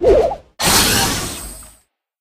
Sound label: transform; meg; Brawl Stars